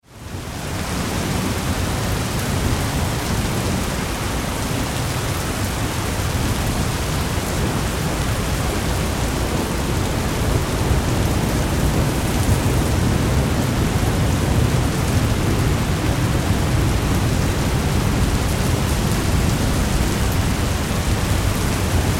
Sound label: Weather Thunderstorm Rain Heavy Thunder Ambience